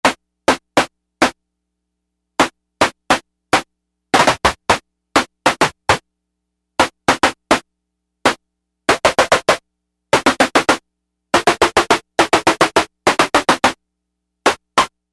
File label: Boxsnare